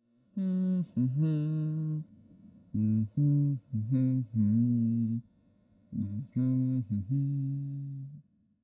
Man Humming

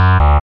Error

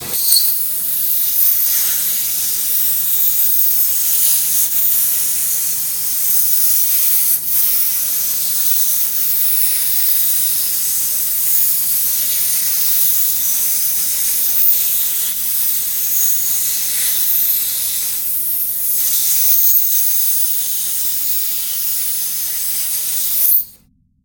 Plasma Cutting Torch

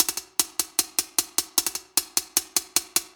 76 Hihat Pattern Verb

